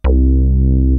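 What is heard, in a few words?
SYNBASS, synth bass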